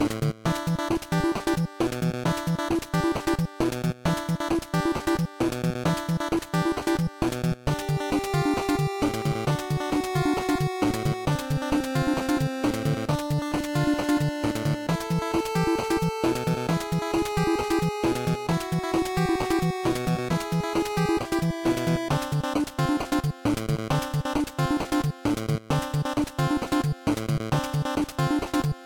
BGM MAP 8bit; Mighty No. 9; 8-bit soundtrack

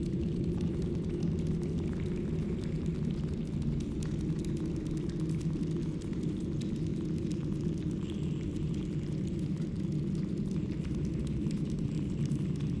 amb feast spooky

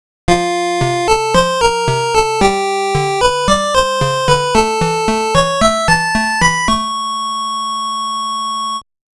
RETURN IN TRIUMPH (STAGE CLEAR); R-Type Original Sound Box

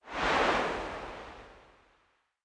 wind movement

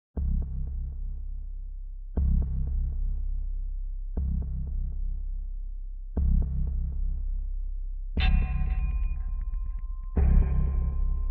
Horror Soundscape Poltergeist; Siren Head The Siren's Forest windows gamerip 2020

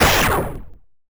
Bow (Sound); Gloom

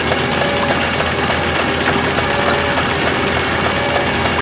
Car Refueling Station; car simulator 2

